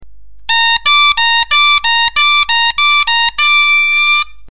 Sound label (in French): si ré octave, bombarde; Partir du si aigu pour aller jusqu'au ré